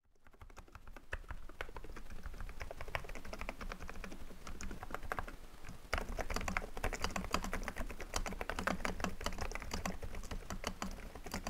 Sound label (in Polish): Nude Rosa dzwiek, przelaczniki; EPOMAKER